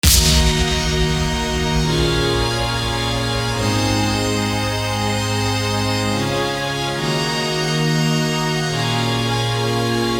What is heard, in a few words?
EDM; Rainbow Dash